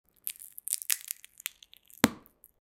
the sound of a breaking

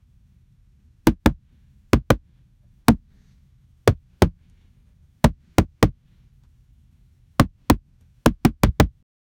create a sound effect of, football

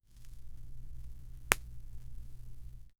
fire 8bit burning

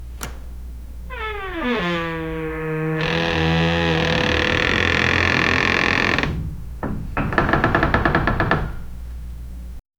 ambient wooden door creak; building

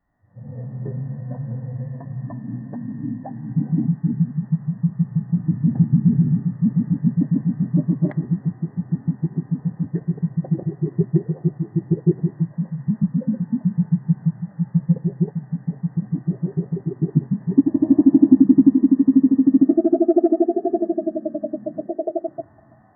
crocodile lurking underwa

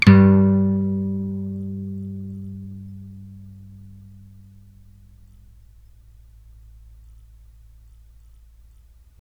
acoustic guitar string

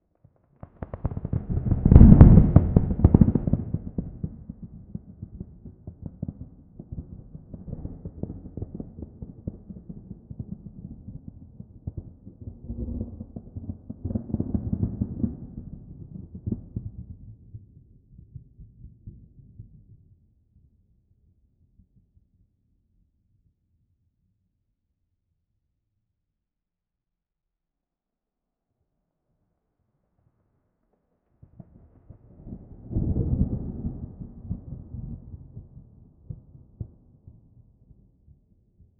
faraway thunderclap with

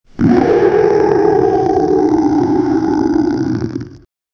maniac monster scared hum, human reactions

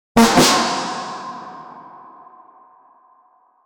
snout sound with echo, animal sounds

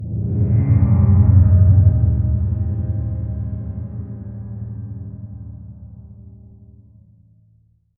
deep low drone airy rise; abstract

